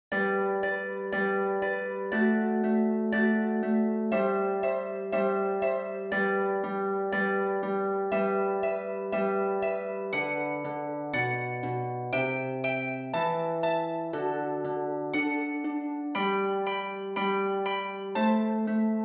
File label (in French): HARPE